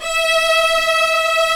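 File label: Cello B; AKAI S-Series CD-ROM Sound Library VOL-2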